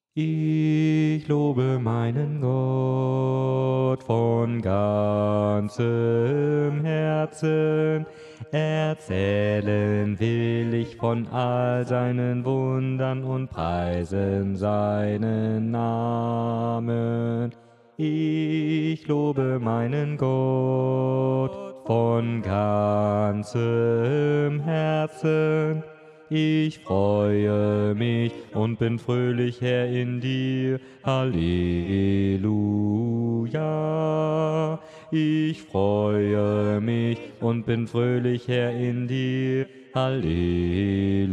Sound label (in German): Ich lobe meinen Gott Bass; Letztes Chorprojekt; projektchor